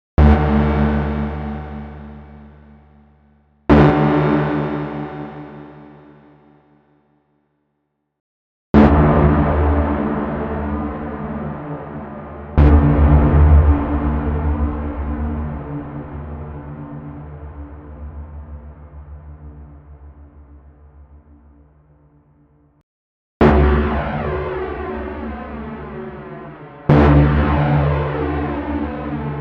Cherry Audio Mercury 4 Reverb Spring Plate Hall

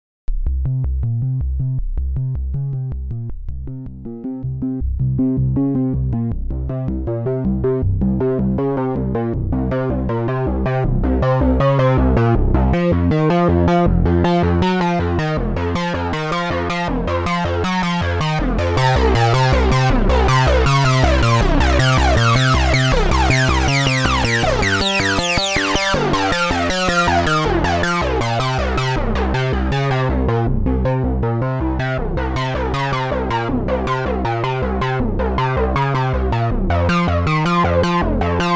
behringer ubxa unisono sync ebm

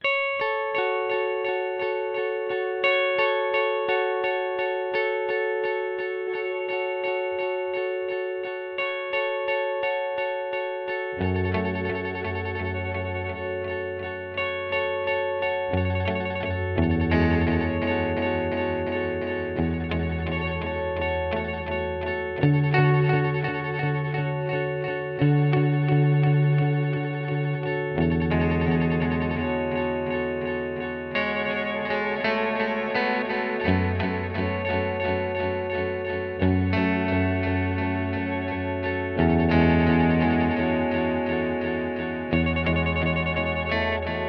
Mood Mk2 Delay Envelope

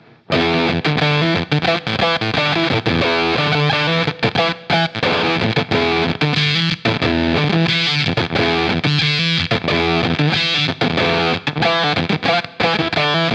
Beetronics Abelha Tropical Fuzz; Polen Buzz 3 Hi 9 Lo 11 Uhr

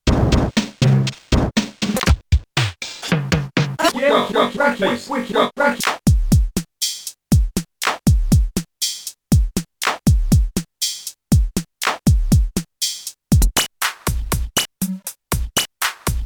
Drumbank; Mega Synthesis

